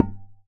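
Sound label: Percussion Tabla; Drums; Acoustic and Electric; Polyend; Simulacrum Sounds; 1 Shots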